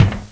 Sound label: wet thud; InventorLabs - Transportation; roadrace